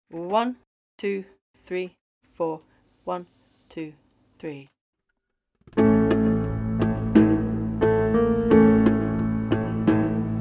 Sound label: A Ya Zain backing